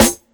trebly steel snare drum sound a sharp key

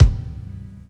thumpy kick sample c sharp key; tuned kick drums

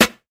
juicy rap snare drum sample a sharp key

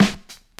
reverb acoustic snare g key; snare drum samples

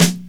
crispy snare one shot f key; Mono; snare-drum-samples

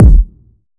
boom kickdrum e key; kick drum samples

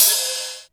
crunchy open hi hat D# key

